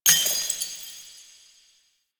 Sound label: investigate analyze complete; Warframe; Cephalon Weave